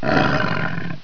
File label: headcrab pain; svencoop